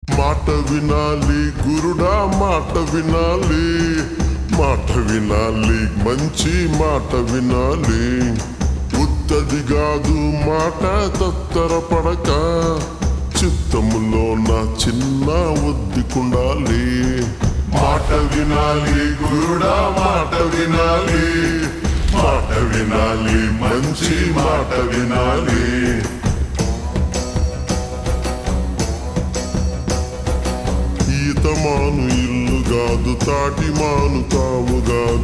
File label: Ringtone Slowed Reverb, Telugu